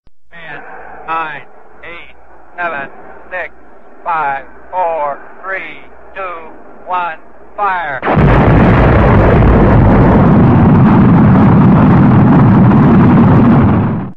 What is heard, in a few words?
Rocket Start